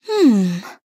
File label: Nonverbal Question; Aurora